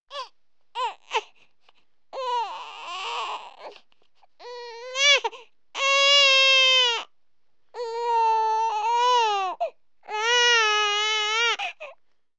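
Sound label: sugar, music production loops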